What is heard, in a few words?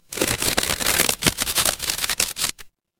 paper fabric crumple